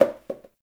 Janitor Paper Towel Drop; Warframe; Ambience; NineteenNinetyNine

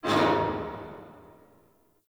Parking Lot Metal Door Distant; Ambience; Warframe; NineteenNinetyNine

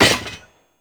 Debris Metal Stone; Ambience; Gameplay; Warframe; Entrati

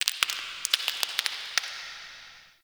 Zariman Stone Debris; Warframe; The New War; Ambience

